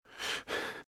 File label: Breathe - Fast Heavy; RadwinIsland